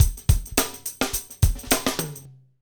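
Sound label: hip hop, Roland drum beats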